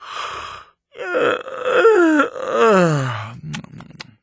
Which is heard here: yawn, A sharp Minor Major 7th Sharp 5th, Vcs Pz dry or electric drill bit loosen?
yawn